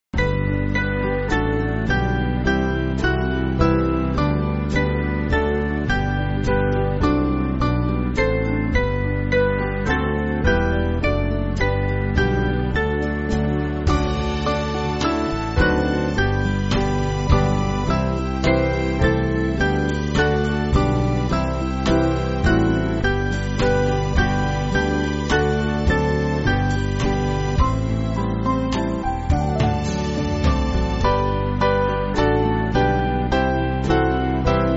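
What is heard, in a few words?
Band, Backing Files for Churches; Eden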